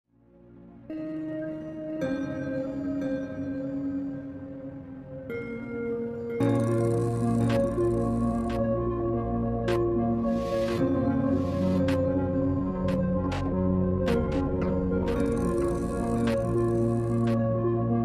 Relax The Dreamy Atmosphere Electronics For Ringtone Slowed reverb